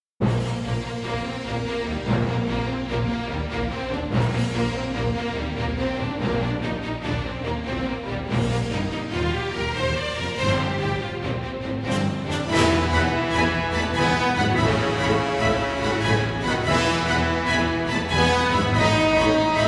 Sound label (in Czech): fanfary